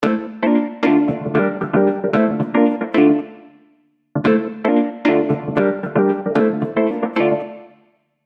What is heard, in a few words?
add filter cut, loop; waves